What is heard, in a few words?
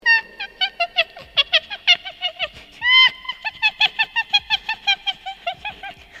witch laugh